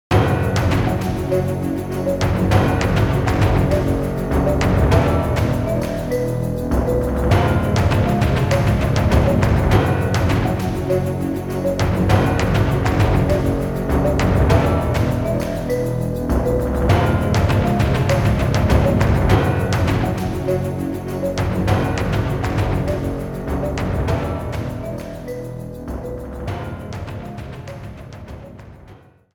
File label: Bootsound; Elliot Quest; Wii U